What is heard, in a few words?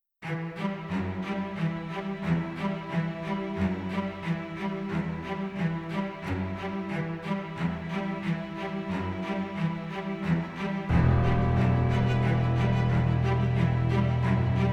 Worlds Enemy Underscore, Drama; Narrative Mind